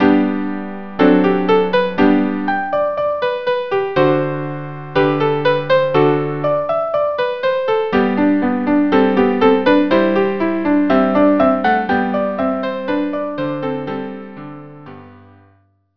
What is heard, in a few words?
sonatina trio; beethoven